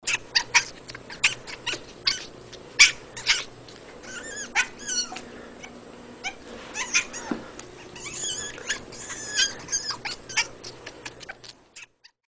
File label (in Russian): Pustynnyi lis